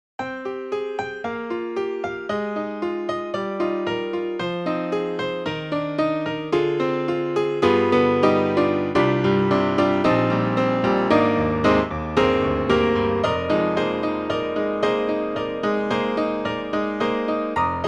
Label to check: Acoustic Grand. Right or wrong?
Right